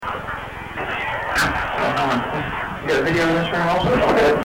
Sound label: house whisper; evp